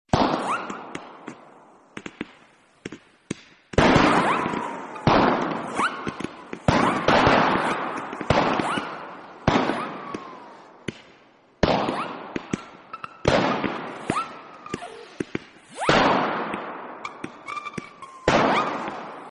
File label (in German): feuerwerk